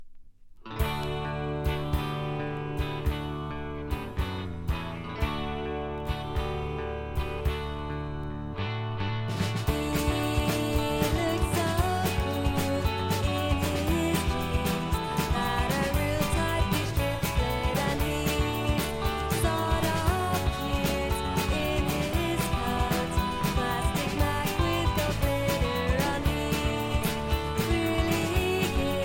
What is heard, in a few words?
cool guitar